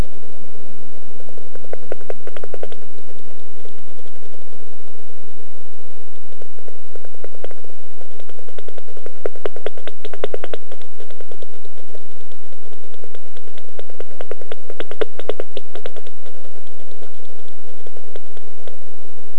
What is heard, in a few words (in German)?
Fledermaus